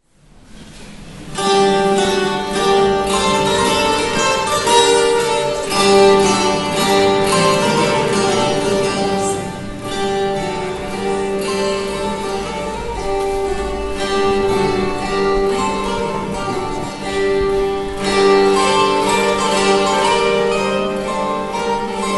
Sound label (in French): tambourin; chedeville; six duos galants